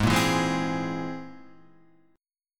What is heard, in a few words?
C Augmented 7th G sharp